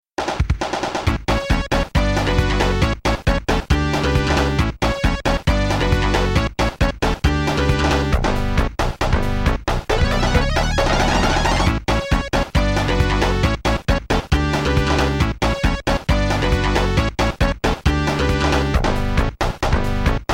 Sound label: invincible; sonic